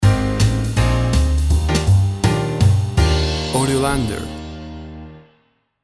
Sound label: Toe Swinging Jazz Music Stinger